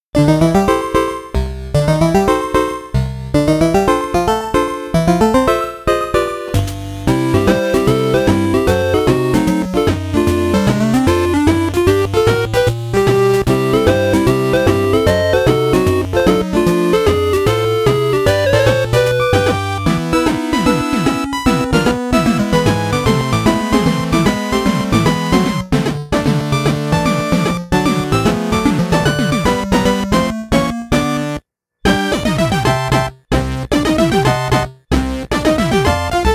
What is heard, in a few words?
Big Band Jazz